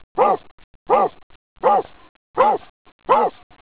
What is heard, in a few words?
barks 8bit; Stogie – Barking; Mission Hill